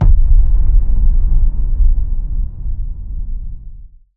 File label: Deep, deep echo